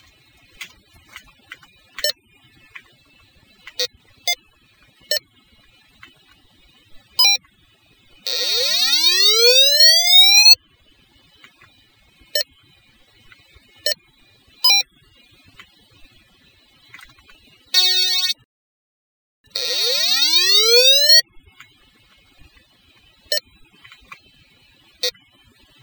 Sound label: snake sounds